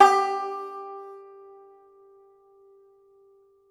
Tenor Banjo

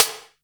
Boss DR-660 Snare

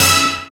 DR. LOOP; X-Static Goldmine 3; CHORDS

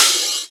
Open HH, Cymbals

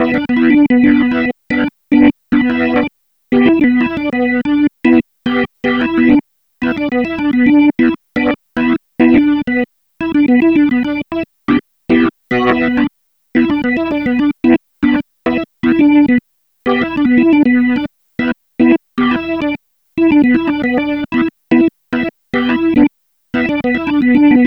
Dirt Org Arpegs, Electro Lounge; Sonic Foundry (Sony Creative Software); 072 Green Street

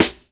SD, HIP, Dance Mega Drums

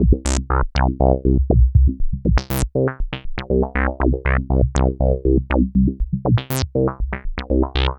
Bassline, Prototechno

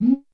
Lo Woo, Drums, Roland JV 1080

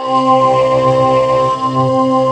VOCODER VOX; Rubber Chicken Software Sample Bank; CYPERPUNK 2

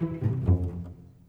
Cellos, Orchestral; Sonic Foundry (Sony Creative Software); Orchestral VOL-2; Modern 12

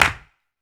CLAP, Human Perc, Body Perc

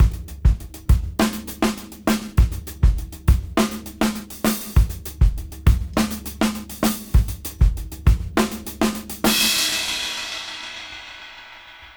Half Funk 101 BPM; AMG - Keith LeBlanc Phat Butt Jams

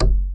acoustic bass perc; Masterbits CLIMAX 7 - Bass

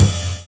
SUSPENS; Univers Sons

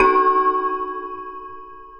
Shunt one shot, Ambient Metals; Sonic Foundry (Sony Creative Software) - Ambient Atmospheres & Rhythms

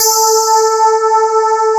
sparkles

